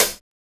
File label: CRUMMY CHH, LOFI HATS